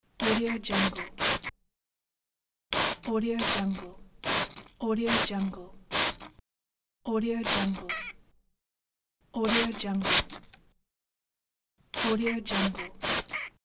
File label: water spray